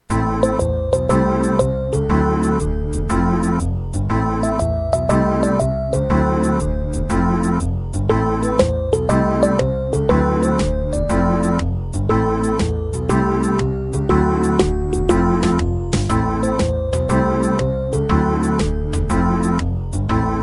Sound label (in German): Kanon Instrumental